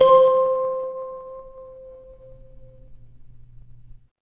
instrument kalimba

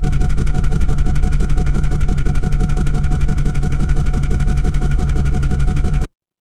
mechanical engine

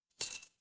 glass shatter

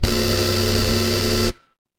feedback buzzer

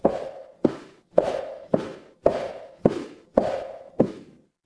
footsteps heels

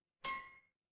glass clink

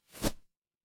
paper fabric fabric

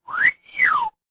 cartoon slide whistle

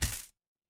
retro game hit

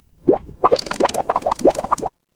water bubble

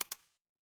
ui click

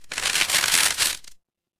paper fabric crumple

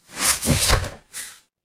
ui delete

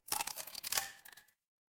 glass crack

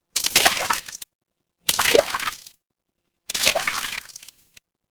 water splash

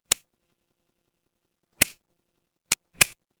fire electric spark

